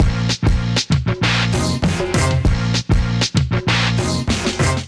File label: Drums 119bpm; MIDI Clips; Ableton; Chop and Swing